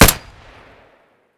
glock17 close; Escape from Tarkov

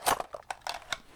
Inf Weapons Foley; Red Orchestra 41-45

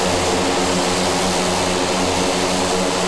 Aircrafts Engines PT6A-25C Back Engine; DCS

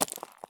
walk slate; Escape from Tarkov

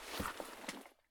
prone gravel; Escape from Tarkov

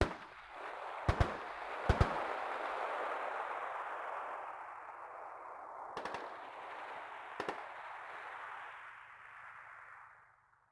automatic, combat atmosphere

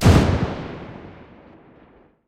mg 8mm besa lastshot; guns; tanks; War Thunder

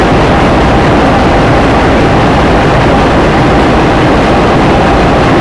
boat engine idle; War Thunder; engines

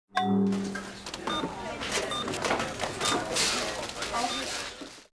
Supermarket; Buildings; Civ4 beyond sword

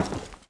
grunt sheetmetal walk

